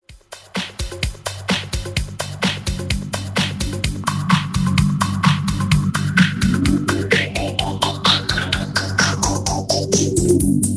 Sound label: Hercules DJ Filter